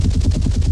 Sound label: HELICPTR